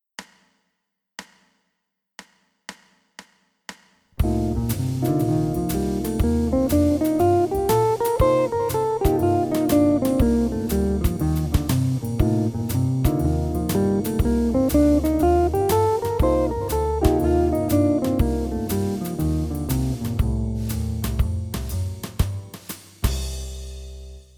Box modo locrio, guitar

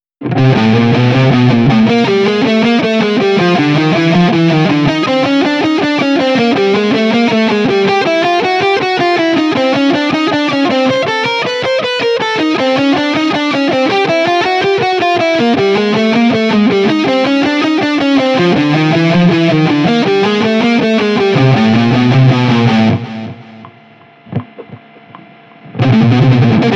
crom contrario salto 1 corda

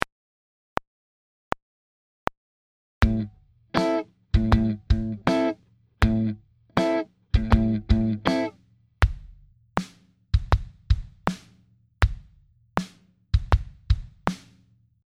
cassa e rullante groove

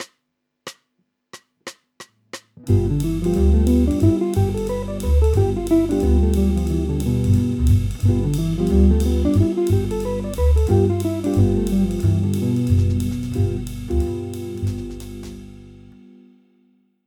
G7 in posizione di C

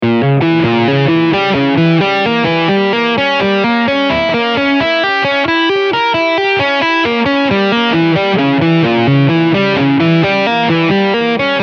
Sib dim

